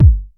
boom steel kick drum sample g sharp key, kick drum single hit tuned to the G# note